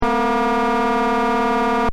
Robot move loop, Robot: Robotic Movement Style Effect; Robots; Robot Sound Effects; Robots (Sound Effects:Science Fiction); Stuart Duffield